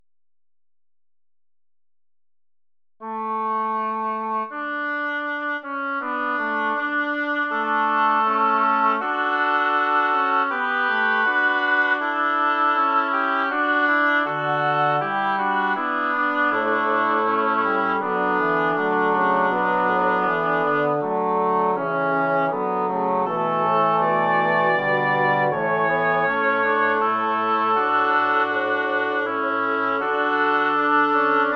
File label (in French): Sanctus, surRe, claves, Tutti; Certon; Missa Christus Resurgens; Polyphonie